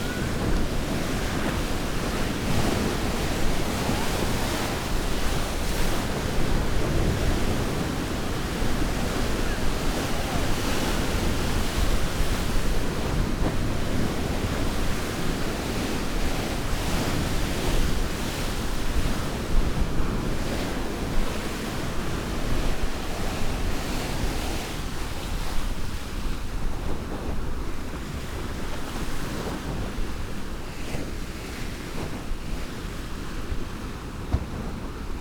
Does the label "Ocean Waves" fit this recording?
Yes